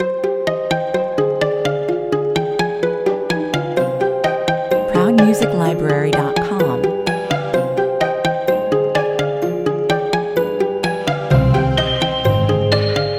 127 bpm, A fusion of classic instruments and contemporary vibe. Pizzicato str; Pizzicato strings and confident high piano melody perfect for closing c